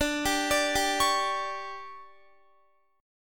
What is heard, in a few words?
D Major 7th Suspended 4th, Guitar; DM7sus4 arpeggiated